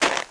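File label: footsteps snow; Spearhead Demo; MacFormat 2003 June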